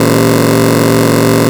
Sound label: ELEK TONE; ELECTRC